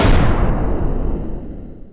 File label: death; ogrotron